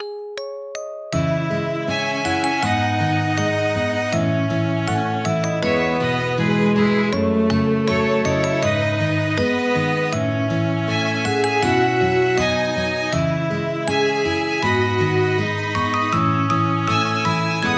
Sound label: bgm sad; cross hearts arcadia; android